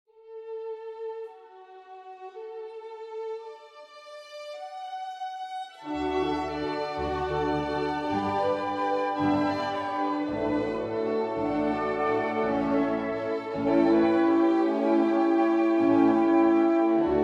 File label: Violin Concerto, G Major, Adagio